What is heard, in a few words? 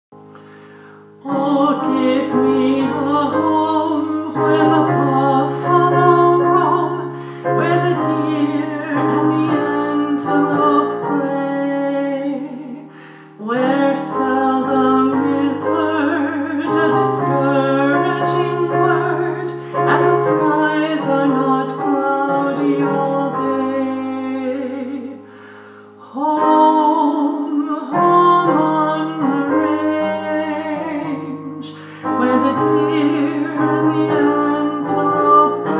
Home on the Range Alto